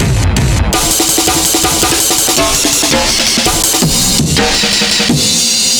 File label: 165 Break, Loops; m8 breaks